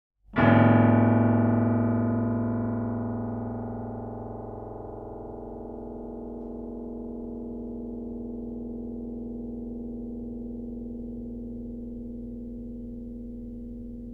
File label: 22Hz 23Hz 24Hz; unplug 3